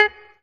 Guitar

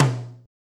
Tom C, PERC

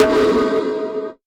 tom distorted; Percs

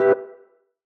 Upstroke

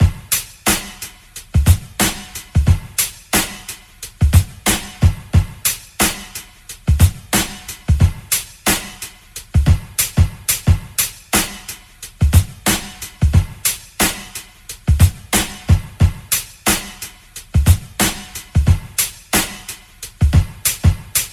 Drum Loop 90 bpm

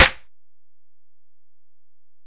Snare

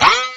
Vox

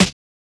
Snares

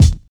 kick

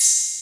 Open Hats; Southside Improved

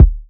Kick

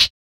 Closed Hats

